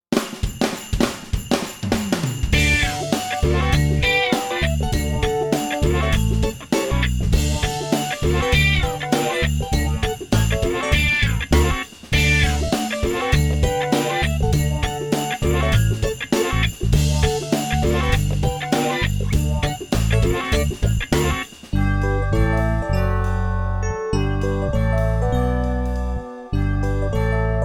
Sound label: Christmas Style